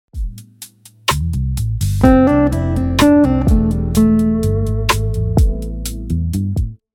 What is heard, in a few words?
Hammer Ons and Pull Offs, guitar